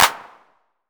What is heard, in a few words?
909 Clap V3 Orig; Goldbaby Tape808 & Tape909; The Tape 909